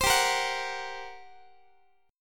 G Minor Major 7th Double Flat 5th, G, down, Guitar, 4A 4E 5A 5D 5G sharp 6C sharp; GmM7bb5; strummed